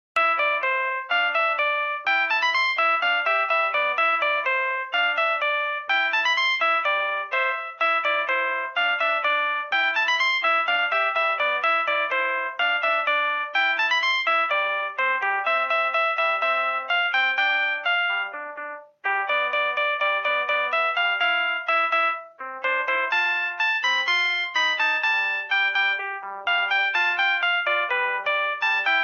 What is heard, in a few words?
ringtone; rezero